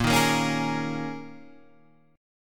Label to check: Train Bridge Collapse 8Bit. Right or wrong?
Wrong